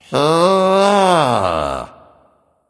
thinking; smite; ahpuch

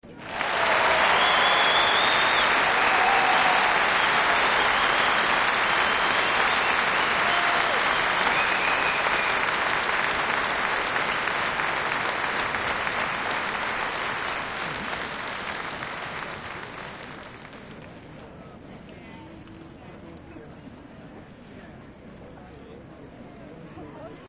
lobby victory background; serqet; smite

